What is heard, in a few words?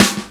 SD HOU, Dance Mega Drums; Best Service